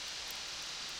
Background Noise

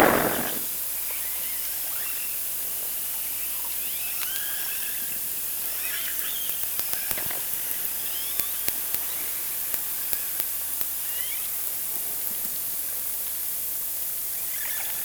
Orca sound Night Passby

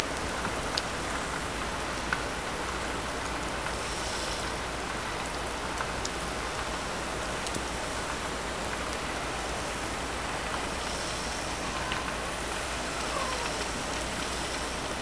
Hydrophones, orcasound

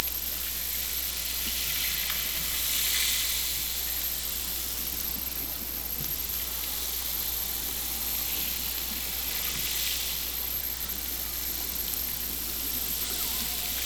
Hydrophones, orcasound

